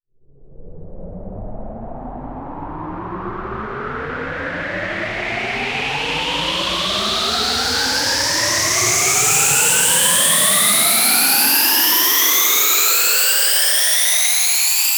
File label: FX Uplifter